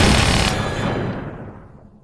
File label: mgsj fire no ammo npc